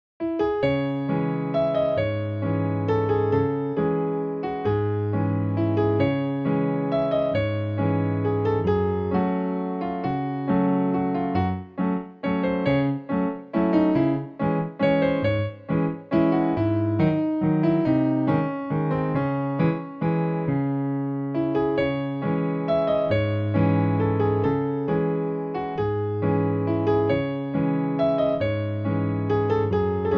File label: Waltz in C Sharp Minor, piano; Essay No.3; Thoughtfulness